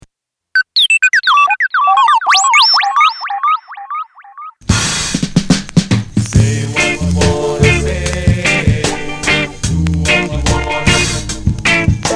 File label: music and noise; Astroroots